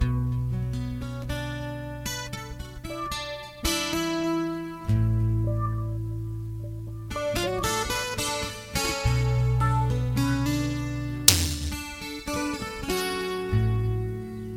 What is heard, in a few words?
Orig Recording w Drum